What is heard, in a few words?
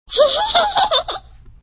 laughing; Dora